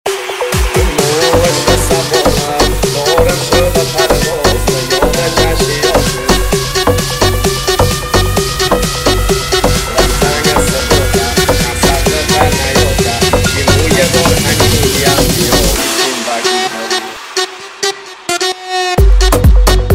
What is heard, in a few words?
remix remix